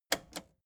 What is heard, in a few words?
record player repeat click slow